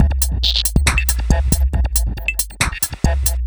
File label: Bpm138 Straight8 Break, dubstep drum loops